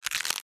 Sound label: Bone breaking